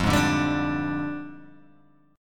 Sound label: F Minor 6th Add 9th, Guitar, Open G